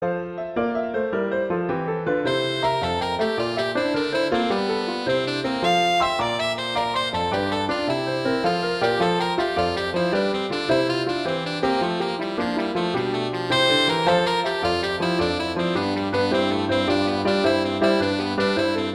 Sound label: Gigue; Pachelbel; Canon